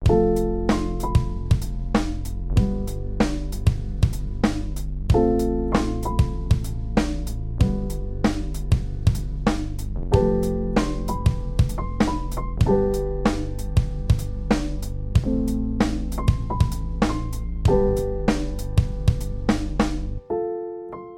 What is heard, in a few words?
INSTRUMENTAL; CAP VIGILANCIA; radio3 raportajes